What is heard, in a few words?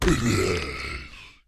zombie hit